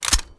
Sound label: clip in